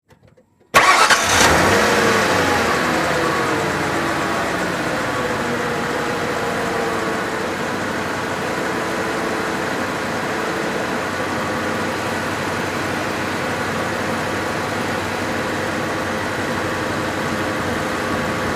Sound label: Expedition Start Idl, ford expedition